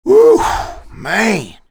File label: sigh; Cars